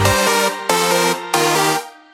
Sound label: Amazing Applause